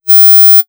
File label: 700ms fade, beacon